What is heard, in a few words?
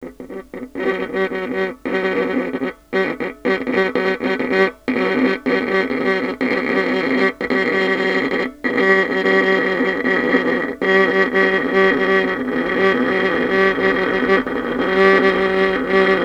Violin Extended Technique